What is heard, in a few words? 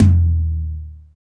TOM TOM, Real